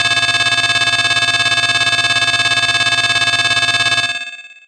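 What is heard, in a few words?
Alarm Call DX100 F#3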